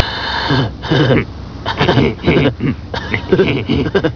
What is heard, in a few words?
laugh